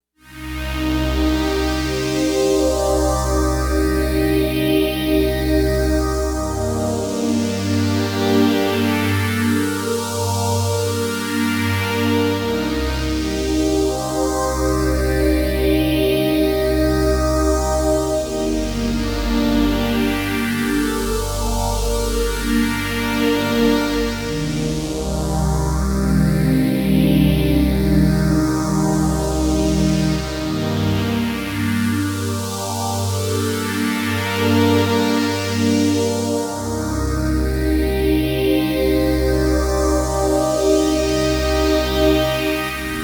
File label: phase pad, jupiter6